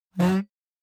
Light furniture sliding on floor moving

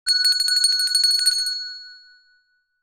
Small handbell ring short version

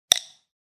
Small sized thin wood panel hit

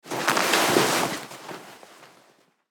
Leather fabric handling

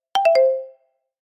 Alert notification

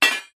Metal object falling on hard ground

